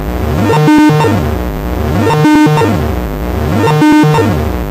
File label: up And Down